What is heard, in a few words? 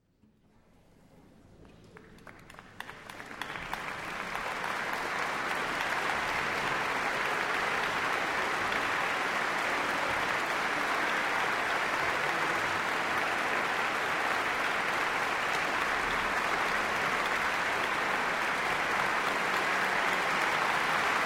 Applause; Munich Philharmonic; Tchaikovsky - Mussorgsky